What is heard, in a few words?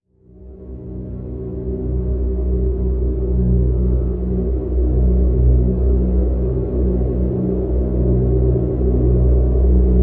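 Drn B, drone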